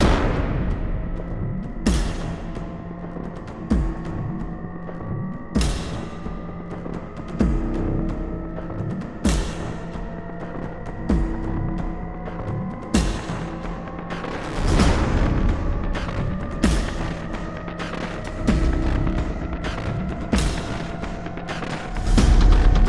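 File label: music, synth low percussion loop; transformers war for cybertron gamerip; ASSAULT TRYP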